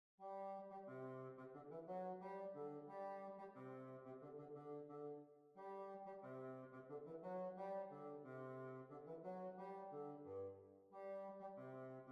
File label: Hornpipe; Staffordshire Hornpipe